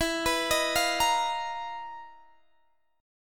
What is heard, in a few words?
B Major 7th Flat 5th E, chord shape; BM7b5/E; arpeggiated; JGuitar